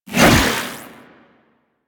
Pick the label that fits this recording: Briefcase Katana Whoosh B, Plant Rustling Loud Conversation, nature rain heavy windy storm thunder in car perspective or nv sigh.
Briefcase Katana Whoosh B